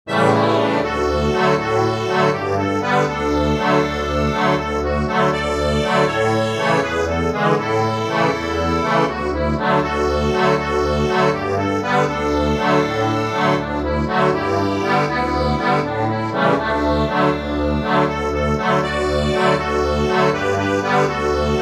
accordion, sheet music

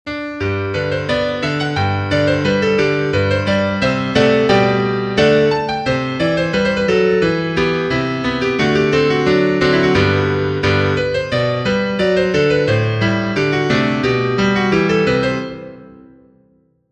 piano, sheet music

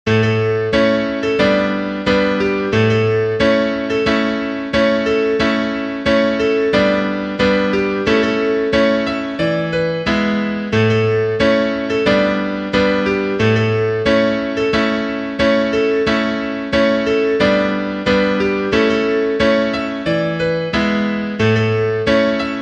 PIANO

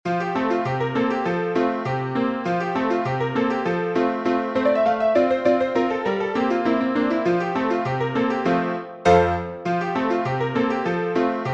piano, sheet music

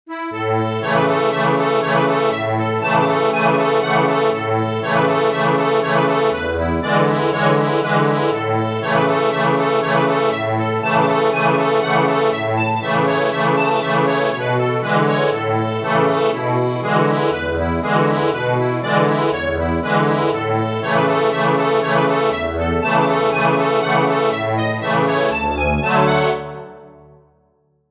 ACCORDION

